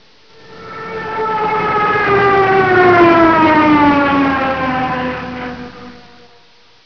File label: stuka plane